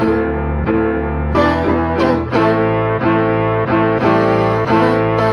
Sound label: piano horns voice adventurous, Loops; Phonky